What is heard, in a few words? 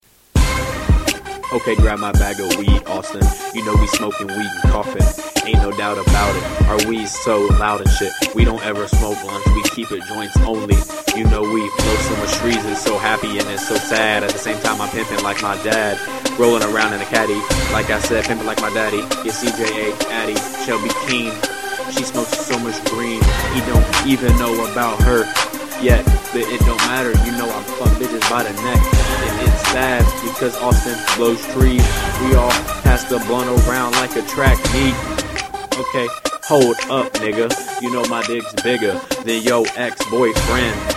instrumental